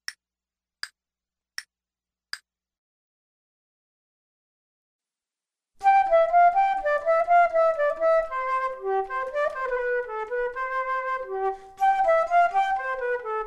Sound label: Duets flute; Selected Duets for Flute Podcast